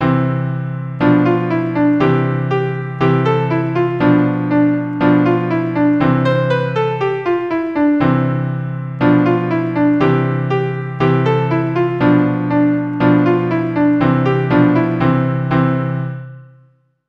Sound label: The Can Can, one chord per bar